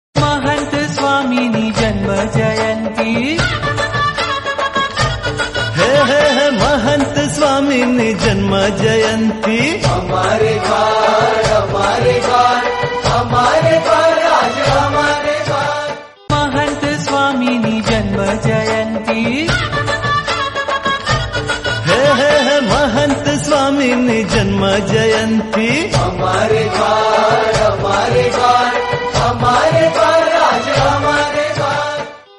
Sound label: Gujrati devotional song; mahant swami; janm jayanti